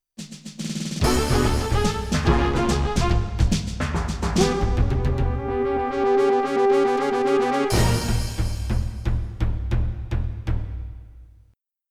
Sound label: PCI